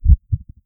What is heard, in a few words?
heartbeat; svencoop; hunger